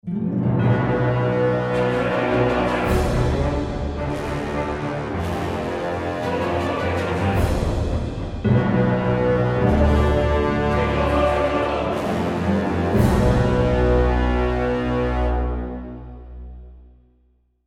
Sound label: start action, music